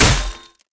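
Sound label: damage staff plate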